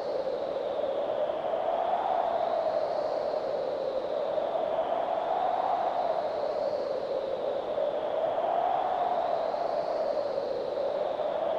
blizzard

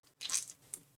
drooling

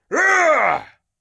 groan

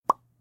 pop

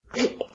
gag

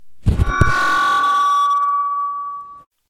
microphone feedback

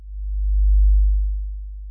pulse

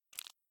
slick

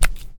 squish slap

